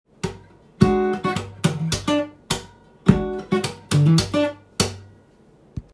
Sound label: slap